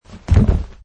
Landing

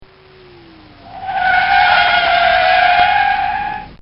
Volkswagon Hard Stop

